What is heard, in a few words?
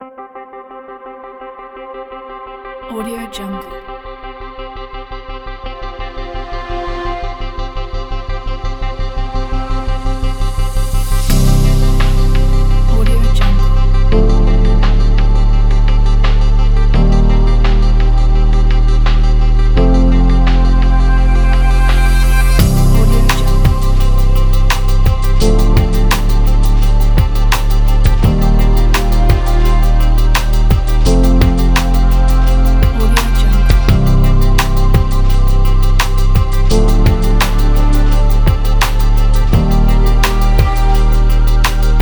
cinematic ambient electronic